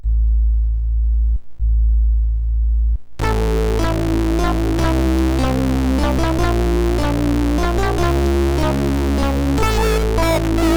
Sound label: Slow Rock, CSG8580R5